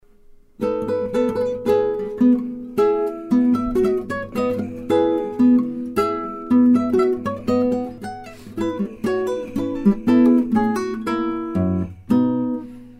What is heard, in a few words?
menuet; tarrega